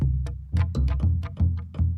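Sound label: Calm Far, music; From Dust; PS3; Xbox 360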